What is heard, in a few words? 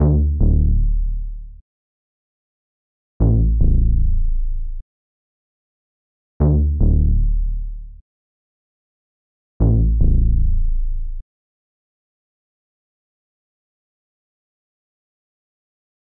150 BPM A Min Bass; Loop Stems; Cymatics; Mixed Feelings